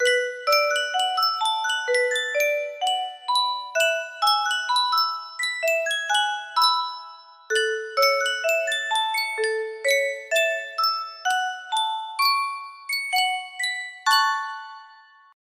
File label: yunsheng music box, music box melody